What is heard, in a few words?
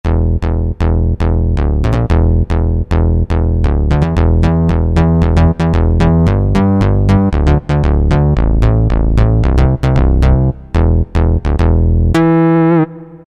SYN.BASS, Roland SN-U110-09 Guitar And Keyboards